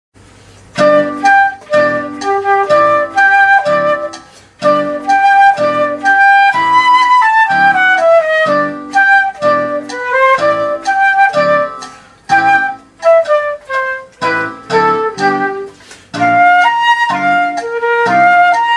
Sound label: flute